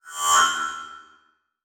Transition Metallic Hit; Production Element

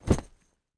Horror Body Drop With Chain

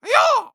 Human Male Karate Yell "Hi-Yah" Angry

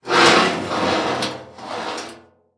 Impact Metal Scrape

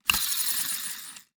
Foley Wheel Spin Quickly

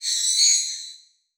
Multimedia Internet CD-Rom Flash Animation

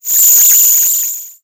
Digital; Adobe; Multimedia Internet CD-Rom Flash

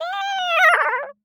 Animal Mammal Carnivore Cat Wildcat Groan; animals; AdobeCC

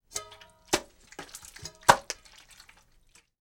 Cooking Flip Food In Pan; household

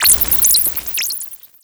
Title Transition Communication Distortion Radio; Production Element Title Transition

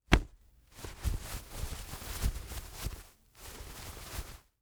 Foley Cloth Hit And Short Rustle Cloth

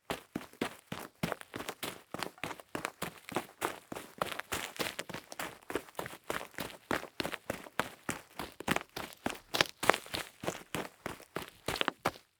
Foley Footstep Boots Running On Leaves and Dirt; Foley Footsteps

